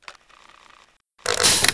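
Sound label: crossbow reload string long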